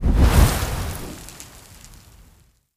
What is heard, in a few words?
Fire In; Lucius III